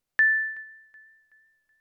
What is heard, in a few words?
paddle